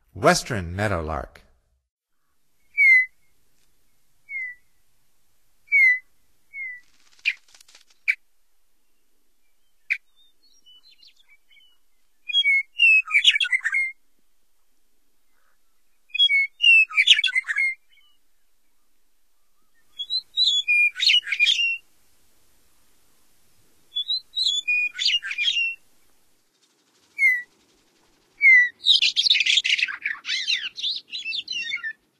Western Meadowlark, Songs of Birds of North America; Stokes